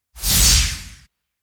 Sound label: white light short searing bursts rumble hiss